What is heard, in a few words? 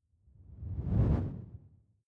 whoosh simple flutter low